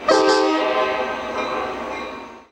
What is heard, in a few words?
horn